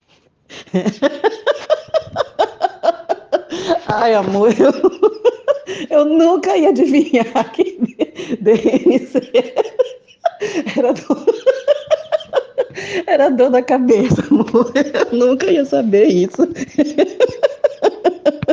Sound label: Laugh